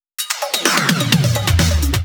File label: Fill 128 BPM; Vengeance Samples; VEH1 Loops